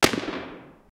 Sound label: antique musket gunshot